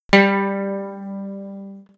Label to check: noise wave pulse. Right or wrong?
Wrong